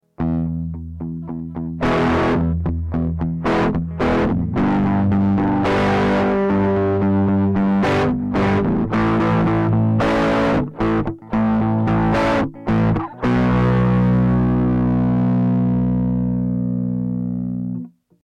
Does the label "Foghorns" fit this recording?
No